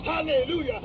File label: hallelujah; Rain